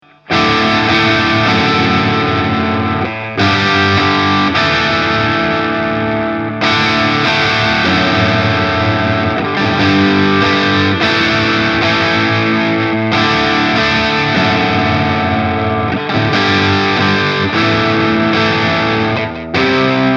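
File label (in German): Danelectro 59X steg full crunch, Gitarre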